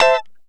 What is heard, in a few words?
Lounge Guitar Chick 9ths D4; Lounge Guitar Chicks